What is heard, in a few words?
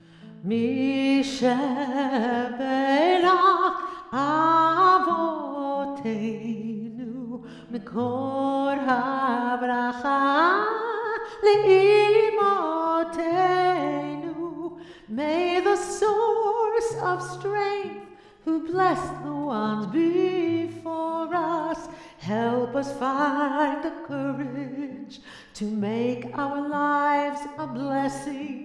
Mi Shebeirach vocals